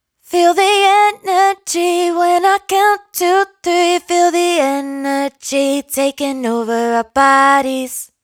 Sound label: hook Feel The Energy 128 Fm, Dry Vocals; Raw Vocals; Slate Digital Omnivox Vocal Sample Pack